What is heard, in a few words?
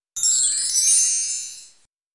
CHIME